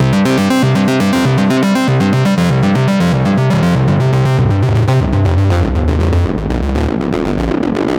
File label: Destroy, синтезатора rock alternative; сэмпл синтезатора